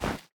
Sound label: Step Run Snow; SandBox Musics